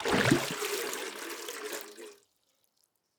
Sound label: waterbucket fill submerge; Rust